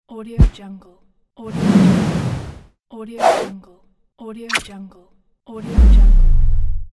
whoosh

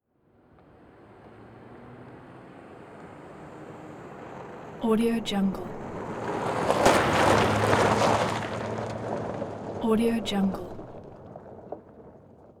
vehicle pavement gravel accelerate mix

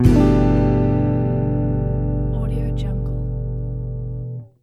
gentle guitar win; drtimwaters

